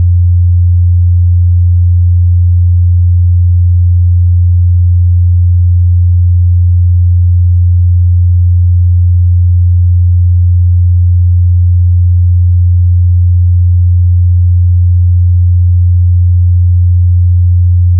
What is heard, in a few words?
87 SINE; SINE WAVEFORMS